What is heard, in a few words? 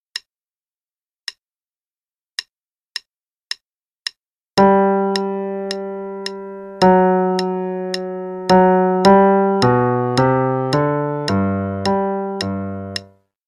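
Play-a-long, March, left hand, quarter note=108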